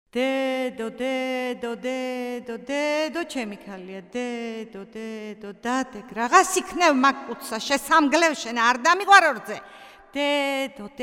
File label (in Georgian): zroxis cvelisa fshauri shromisa; Mzetamze